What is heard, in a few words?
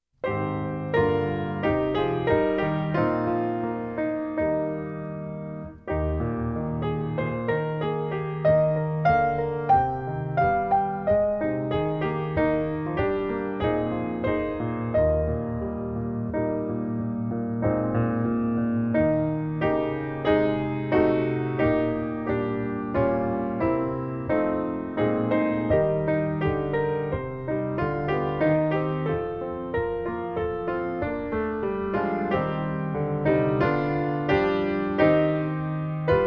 The Minstrel Boy (Accomp), trumpet; The Canadian Brass Book Of Easy Trumpet Solos; Hal Leonard